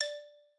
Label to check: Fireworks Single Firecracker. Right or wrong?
Wrong